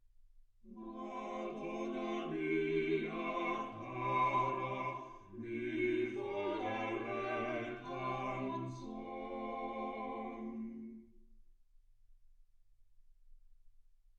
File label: quartet